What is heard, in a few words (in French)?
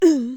melodie; code game jam drop plafond 2025